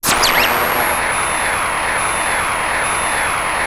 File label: noise